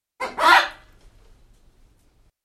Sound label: parrot noise